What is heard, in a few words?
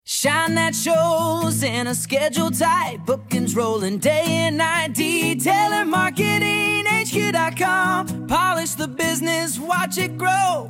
jingle